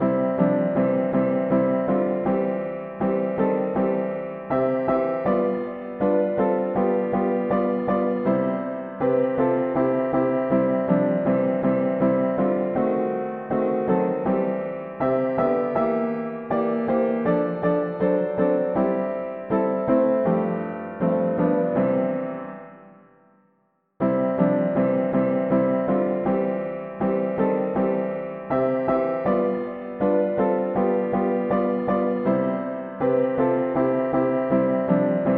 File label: mono piano; hymns